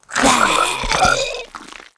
Croaker dead

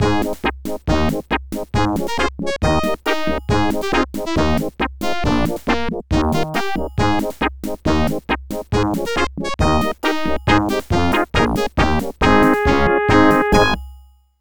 Marching Mice; Putt-Putt Joins the Parade; 3DO, Android, iOS, macOS, MS-DOS, Windows

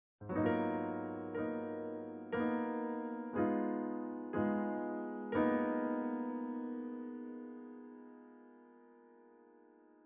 Piano

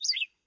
Obstacle Bird Block; In Obstacle Bird Block

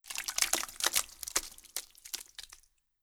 Pirate Moovegore

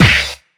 Bop; BopIt SFX Bop Remaster 2

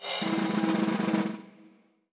dig

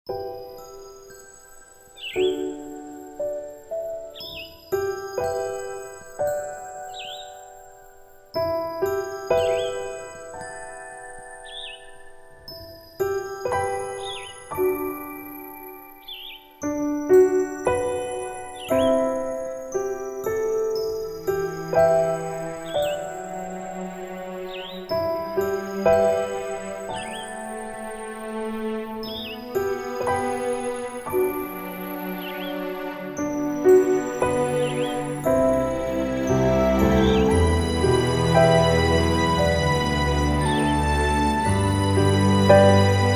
Music BGM Menu; gamerip; Wenjia; iOS, Switch, Windows, Xbox One

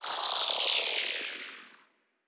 laser

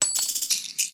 Money Spend; unsighted

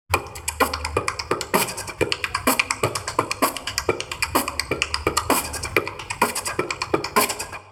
beatbox 16 beats; speaker camp

